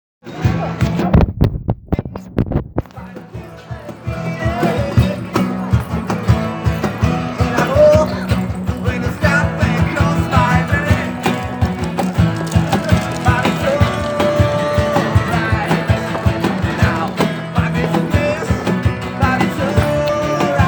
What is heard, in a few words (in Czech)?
hudebníci